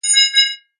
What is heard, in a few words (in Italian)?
ciottoli zeitverz; acque